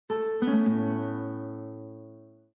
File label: Windows Logoff Sound